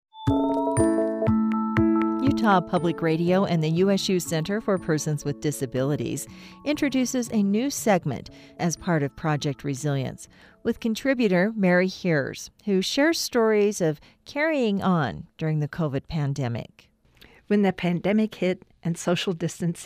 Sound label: BELL CHOIR